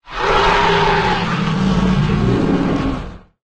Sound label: Spinosaurus